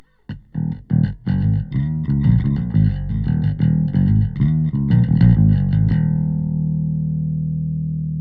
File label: 808 Bass default; SubBass Doctor